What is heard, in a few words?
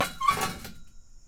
perc